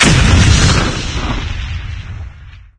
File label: explosion; guns n zombies 2014 pc